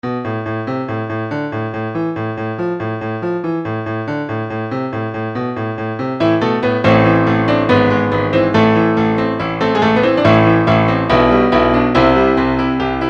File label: Piano; BeatSaber